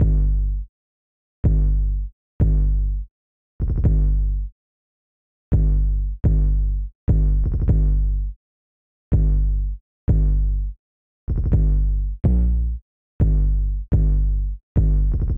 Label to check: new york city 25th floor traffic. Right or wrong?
Wrong